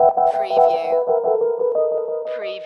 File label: Hip Hop Dark Rhodes Licks 90 BPM Straight - E